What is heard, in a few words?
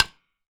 Wood Stick Ring